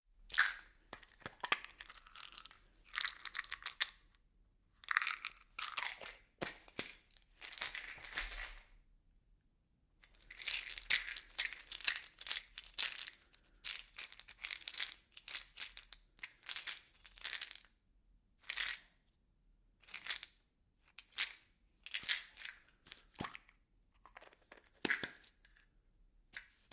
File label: objects in plastic bottle